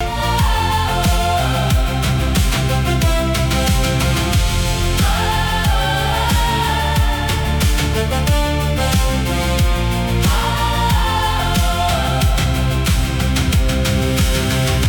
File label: loop